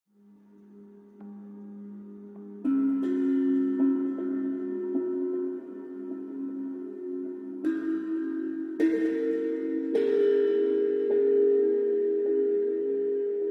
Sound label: Cosmic Power 364 Hz